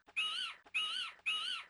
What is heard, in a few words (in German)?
fledermaus; jazz jackrabbit 2